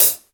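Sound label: hi-hat closed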